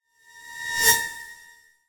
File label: leisure toy drone nano pass fast